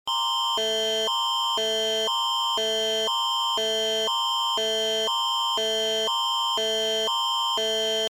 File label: Canadian Alerting Attention Signal